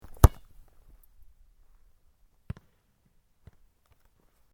Sport Soccer Kick Grass, Soccer ball sounds; Soccer (Sound Effects:Sports)

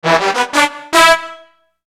Musical Melody Brass Low; Musical Sound Design; Blastwave

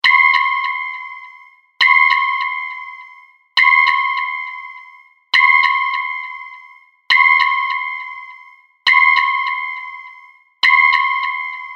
Spaceship Sonar Ping; Scifi; 5.1; Sound Effects:Science Fiction; BlastWave

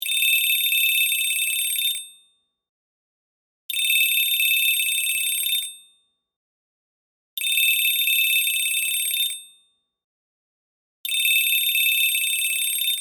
Telephone Ring; Telephone, Receiver, Pick, Up, Western, Electric, Trimline, Phone; BlastWave

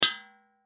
Impact Metal Propane Tank Empty Hit Metal Ring; Impact Metal Propane Tank Empty Hit Light Metal Ring; Blastwave

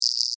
Insect Voice Chirp, Vocalization, Bug; Animal

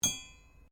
cutlery general place cloth, Household cutlery

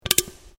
Can Aluminium Inside Bend

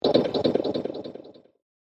Sting Choppy Delays, sound design; Stingers; Big Room Sound

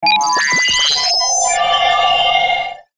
Stinger Number Beep Filter; Production Element Imaging Element Accent Transition

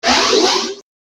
Space Glide Whoosh; Whoosh Sound Effects; science fiction sound effect; Andrew Fleming

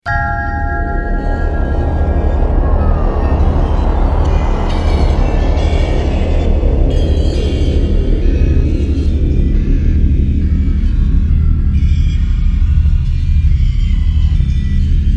Synth, Stab, Drone, Horror, 5.1; Chimes, Creepy, Horror, 5.1; BlastWave

